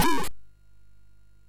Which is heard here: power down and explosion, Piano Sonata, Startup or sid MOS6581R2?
sid MOS6581R2